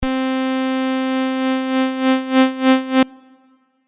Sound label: trem